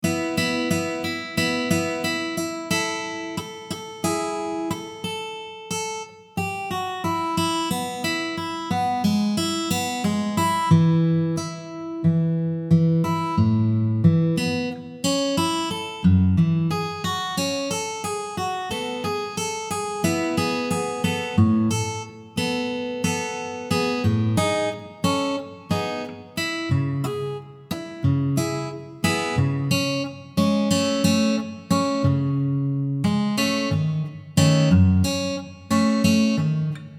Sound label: guitar; transformer demo